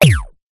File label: science fiction thud impact hit generic; zapsplat